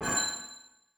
Boss Chain Blink; helltaker

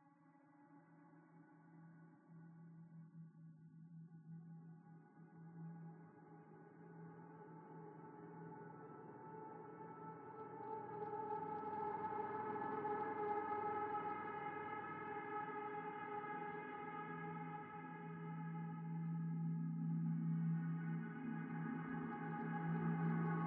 Pad Second Interior One

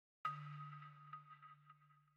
Balafon; Frozen Flame; windows

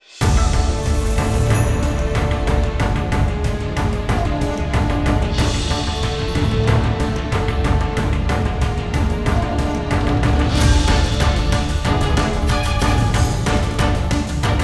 Roaring Thunder; Vampire Survivors; Android; macOS; Windows

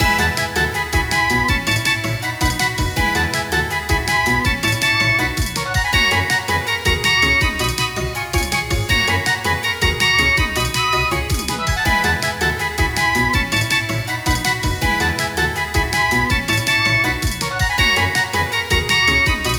Result (Win) [End of Battle]

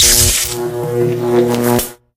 Pigs Electrocuted; angry birds

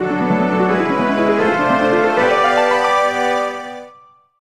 Appear; Legend of Zelda The Majora's Mask 3D 2015 3ds

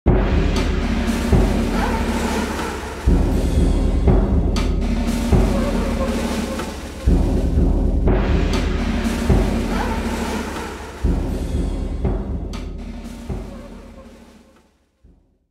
Lose Short; heroes of the pacific ps2 windows xbox gamerip 2005

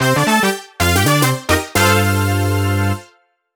Jingle Toy Sports Openning; Clubhouse Games: 51 Worldwide Classics

